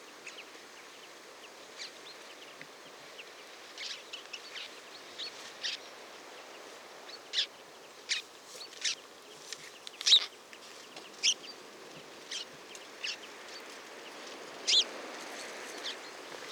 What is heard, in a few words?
House Sparrow Passer domesticus